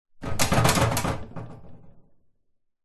household shelving rack loose objects metal shake rattle wobble short; zapsplat